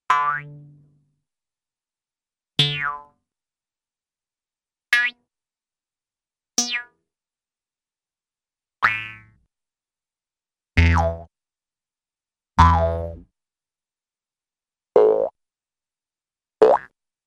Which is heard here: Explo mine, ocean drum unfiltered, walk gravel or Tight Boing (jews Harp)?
Tight Boing (jews Harp)